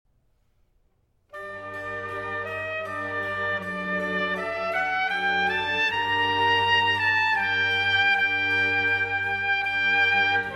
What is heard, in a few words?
Largo (Arr. for Trumpet & Orch; Trumpet & Orchestra; Concerto for Oboe and Violin in B-Flat Major